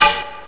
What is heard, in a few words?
ROCK; thief